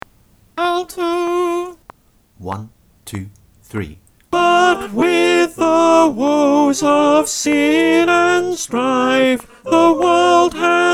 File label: It Came Upon - verse 2 - alto click